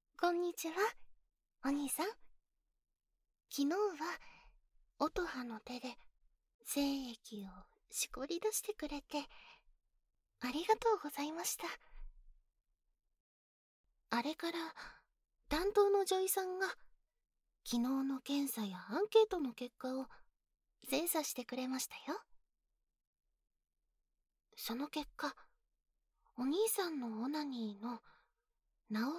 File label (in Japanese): 淫語耳舐め手コキ